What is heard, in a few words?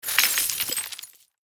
Broken glass rattle small shards